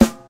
natural snare sound D key; snare drum samples

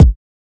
2000s solid kick drum a key; kick drum samples

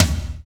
hi tom drum d sharp key

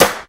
sparkly snare sound c sharp key

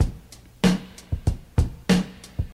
95 bpm high quality breakbeat f sharp key

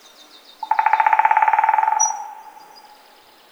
woodpecker drumming

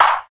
studio clap single shot b key; single hits one shots

